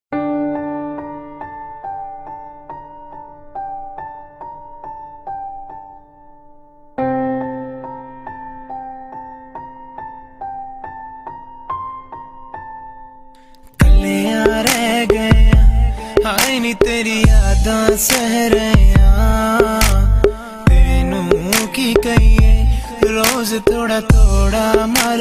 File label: Alone Sad